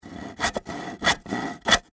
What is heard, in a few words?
Industry Saw Wood Handsaw; Saws; Machines